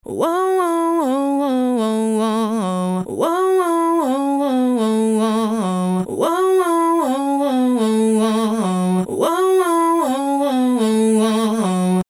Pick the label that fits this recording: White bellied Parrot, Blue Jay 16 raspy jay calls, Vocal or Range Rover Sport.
Vocal